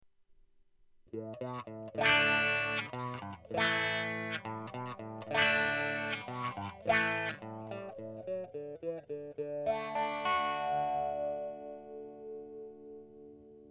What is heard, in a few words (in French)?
clean wha, guitare